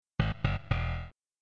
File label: Killed; SNES; chiptune; Super Noah's Ark 3D (1994); Wisdom Tree; iD; SPC